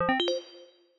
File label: success